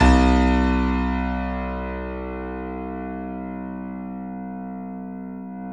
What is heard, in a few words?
C7 F, The Ultimate Piano Collection; East West